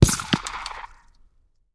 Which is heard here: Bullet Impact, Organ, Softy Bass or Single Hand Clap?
Bullet Impact